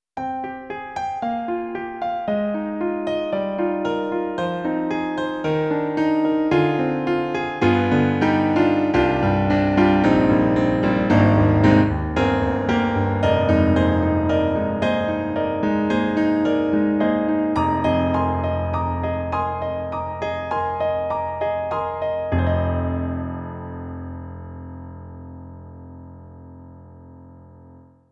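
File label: Pure Grand, piano; Roland; SonicCell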